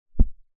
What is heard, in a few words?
book large heavy hard back close; household